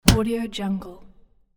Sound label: punch in face